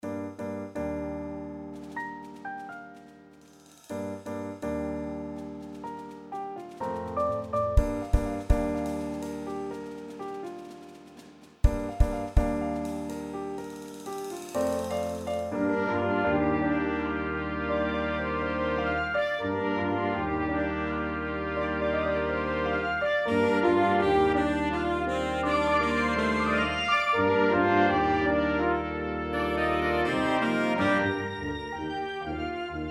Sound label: Rain